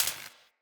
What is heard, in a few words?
Shield Orb Zap